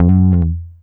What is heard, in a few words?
GTR TRIK, Jazzistic; Univers Sons